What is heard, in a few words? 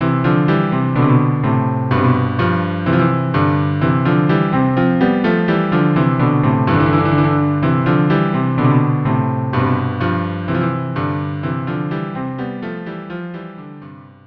Musette cello duo; La Palatine; chedeville